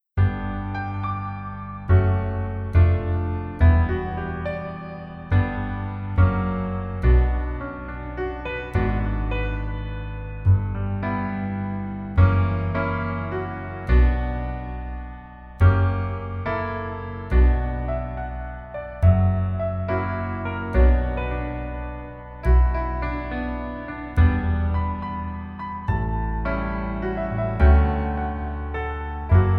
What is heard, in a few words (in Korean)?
Piano ver 멜로디 MR